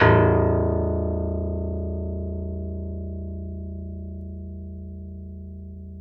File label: PIANO A0, STEINWAY; Greytsounds - Philip Wolfe David Crozier's Saxophones; GR8 STEINWAY